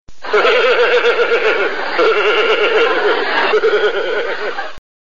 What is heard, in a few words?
Smeh laughter